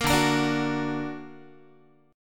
D Augmented D down Guitar; D+ strummed; JGuitar

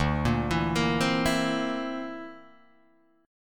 D 7th Suspended 2nd Sharp 5th, Guitar Drop D

